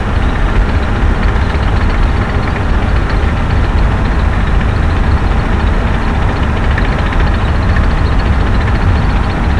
tank drive by

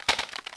object dropped

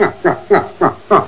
"nyuk nyuk"

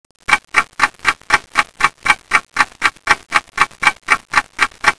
tnt tick

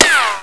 ric, bullets

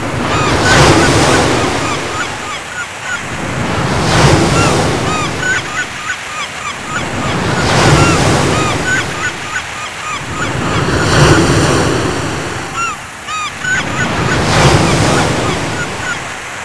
seashore; bunkerhill

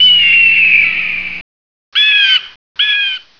eagle; de dustier

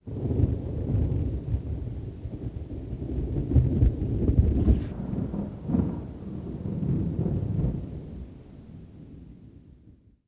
thunder

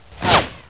whizz, bullets

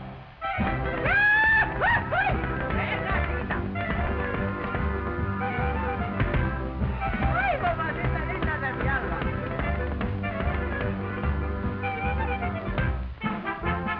Mexican Hat Dance; mexico

